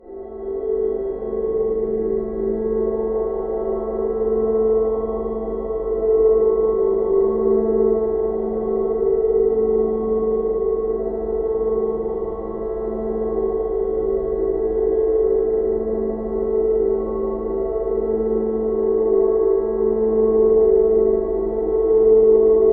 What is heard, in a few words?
Atmospheric Drone; Drones; Glitchedtones